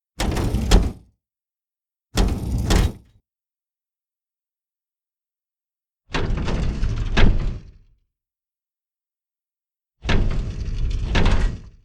Scifi Metal Door Opening And Closing Sound

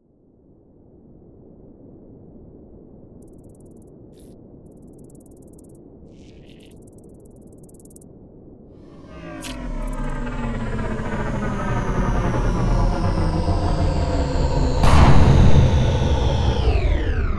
Desert planet landing

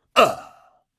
Man Hit

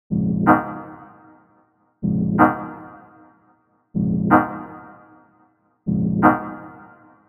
Techno Chords

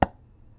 Plastic Lid Tap

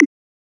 Perc Tom Click

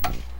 Tv Off Short

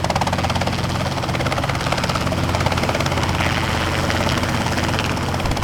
Flying Loop

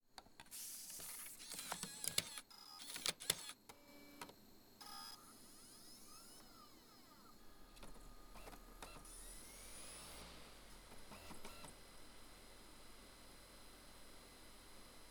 Insert And Eject Cd

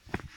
Walking

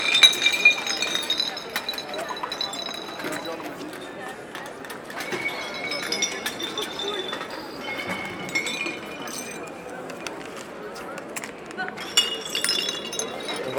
After Party

